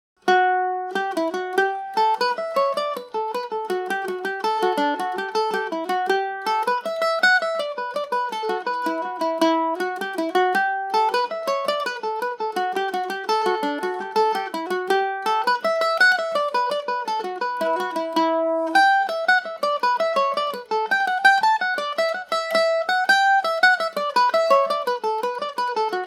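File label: E dorian, Irish mandolin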